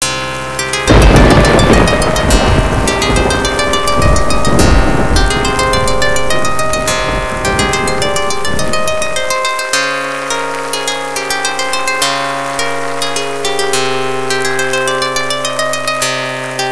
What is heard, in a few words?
Interlude